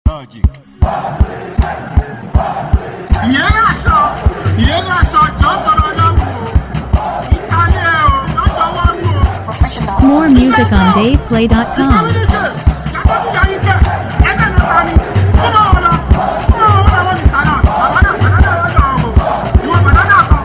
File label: Professional Beat Victory